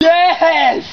yeahes; ooh ahh

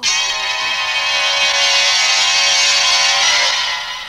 horror music

